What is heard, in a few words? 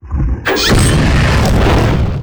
attack; DAGON; Mob